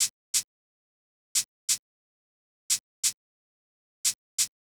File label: Shaker, 089 Cmin, Drum Tracks; Hip Hop; big fish audio; Hip Hop Exotica; Apple Loops